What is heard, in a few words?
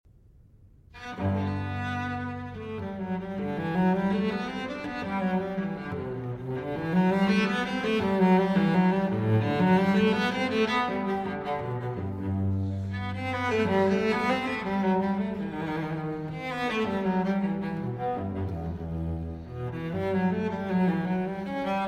Cello Suite in G Major Allemande; Cello Suite No. 1 in G Major, BWV 1007; II. Allemande